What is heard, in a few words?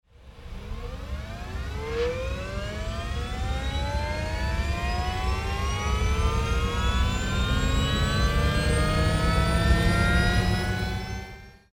mercedes amg one ev acceleration